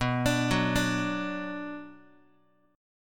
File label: B Minor B Guitar; arpeggiated; JGuitar